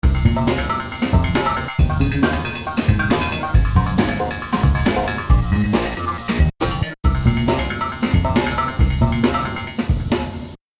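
Beats, COSM’s Side Band Filter is used to give an impression that there's a melodic instrument.; V-Synth; HyperShooter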